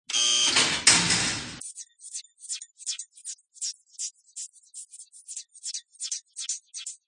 Lab door; Tootbotics

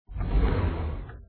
moonbase short door; Quicksilver

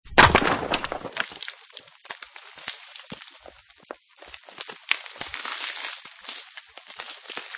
Pirates Cave Rock; Quicksilver

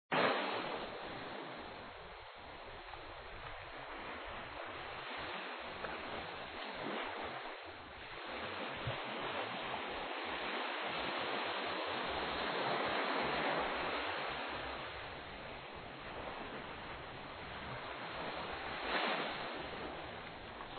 waves; tootoona

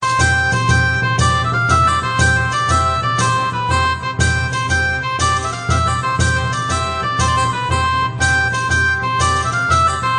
Princess Room bg; Quicksilver

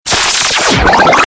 ship hit; Quicksilver

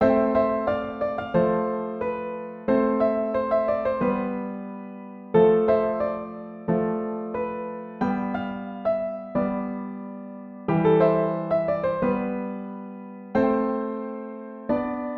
Piano Music

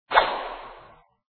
bigtop swipe

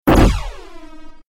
fire; Quicksilver

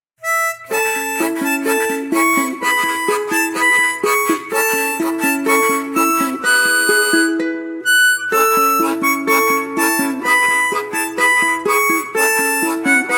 Harmonica and Ukulele Lullaby; mindmapthat; Auld Lang Syne

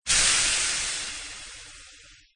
steam

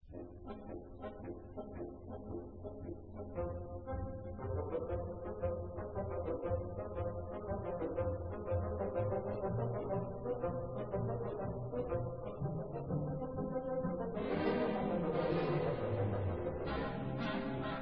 Magic House bg; Quicksilver

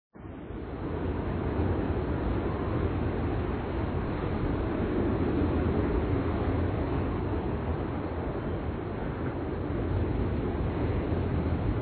big tree water lull; Quicksilver

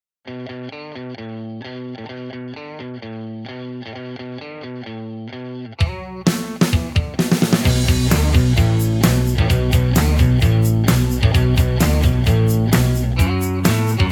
Heavy Rock

